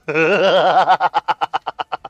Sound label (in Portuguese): risadinha